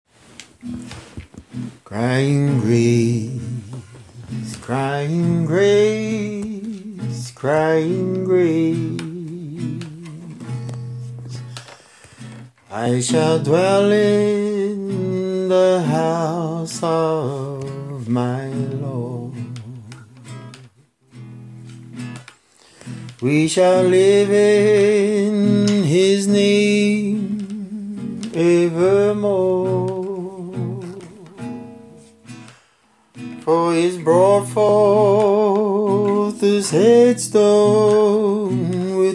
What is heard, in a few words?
Crying